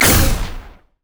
prismatic wave; LoL; lux

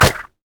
sad mummy crit attack; LoL; amumu

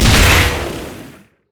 explode; LoL; velkoz skin01

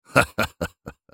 laugh; udyr; en US

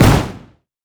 turret explosive; LoL; heimerdinger

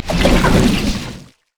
sonic wave; LoL; leesin skin05

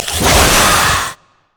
spider attack; LoL

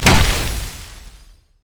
sonicwave; LoL; leesin skin05

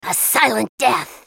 attack; Kennen; Character Voices - English

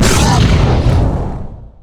spectral; LoL; yorick

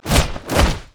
emote laugh; leona skin04

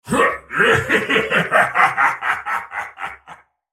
laugh; Viktor; Character Voices - English; en US

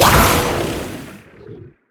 explode; Vel'Koz

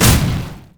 tricleave; riven

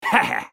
laugh; MasterYi

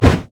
wraith basic attack; monsters; LoL

